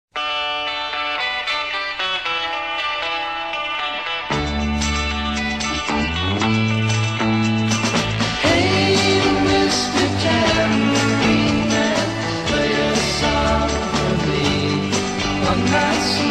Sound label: radio jingle; radionest